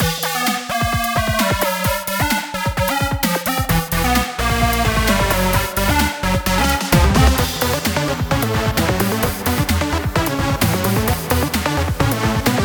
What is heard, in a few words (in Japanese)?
ボーカル曲のインスト, 激しい楽曲, ダークホラー、アンティーク